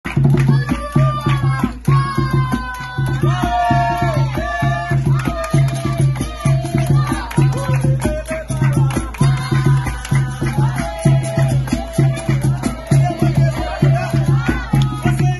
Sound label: capoeira; brasilien capoeira